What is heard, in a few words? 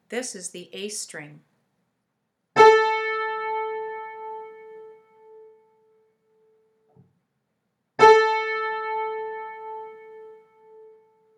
A String; close to floor